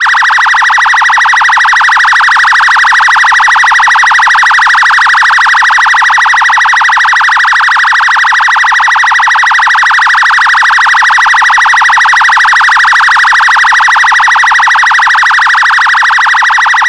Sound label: electronic phaser siren